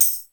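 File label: TAMBOURINE, SR16 percussion; musicradar-synth-samples; Classic drum machines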